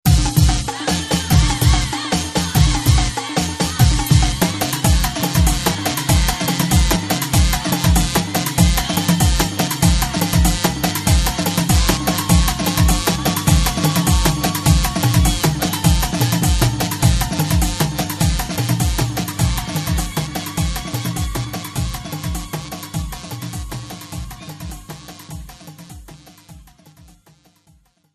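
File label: Instrumental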